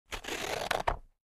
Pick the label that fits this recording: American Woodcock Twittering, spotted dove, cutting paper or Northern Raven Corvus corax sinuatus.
cutting paper